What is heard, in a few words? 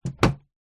household window close wooden old single pane